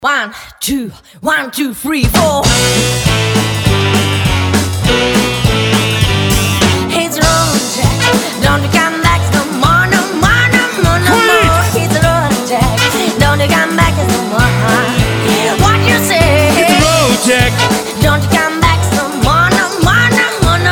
Frenchcore version

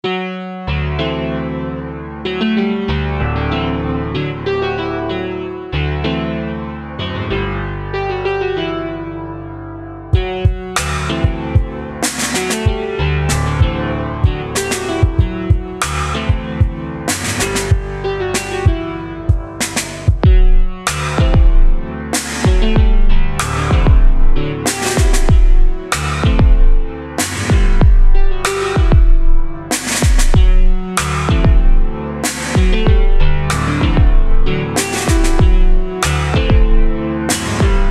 Jazz

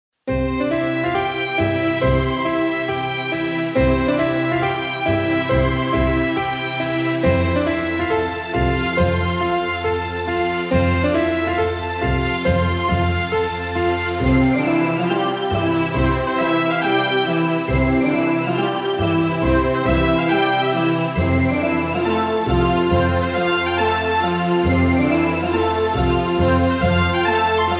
Kids Toys Background Music

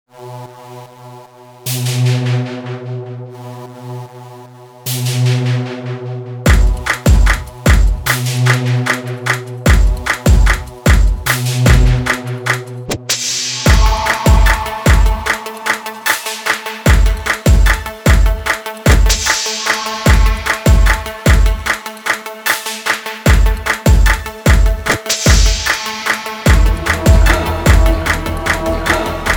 Dubstep & Trap

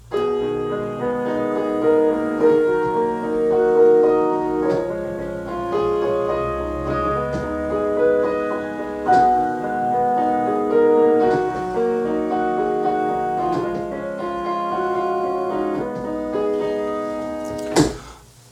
A Piano Solo

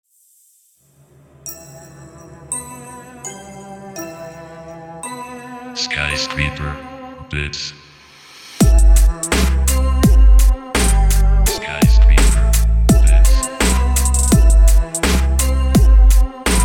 New Beats

